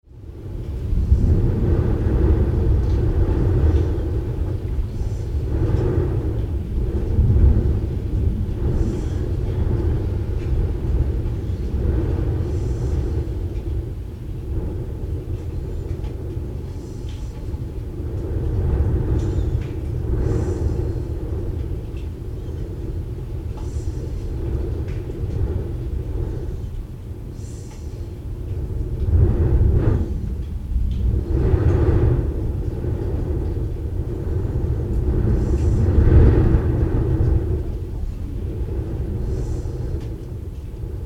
Wind in Chimney Stack, Blustery wind in tall chimney stack - interior; interior storm wind; Tom Hutchings